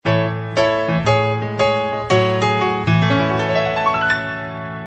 jingle bells piano ringtone

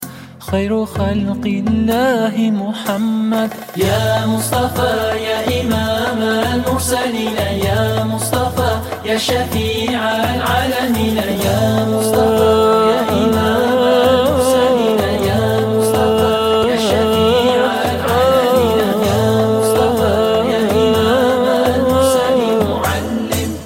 naat

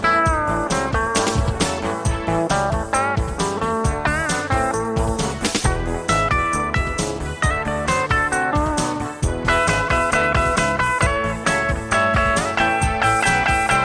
christmas once more music

